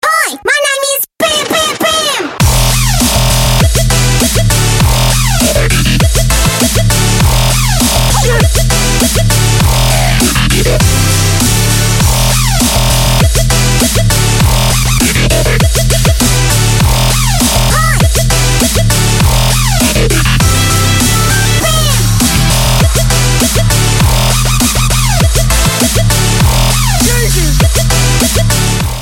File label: Dubstep